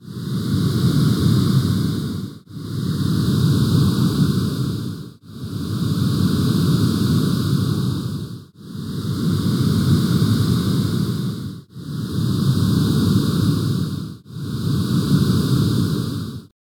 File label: alien breath